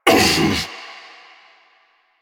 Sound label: Stalker Pain Grunt; Warframe; Quests; Jade Shadows